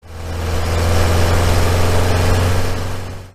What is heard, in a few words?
van